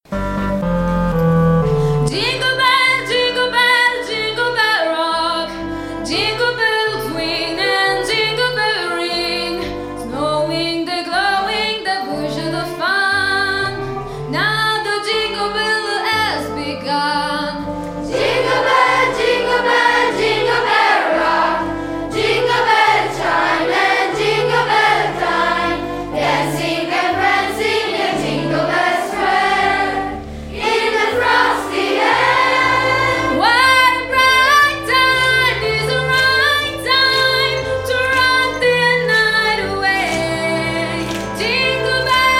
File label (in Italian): coro; jingle bells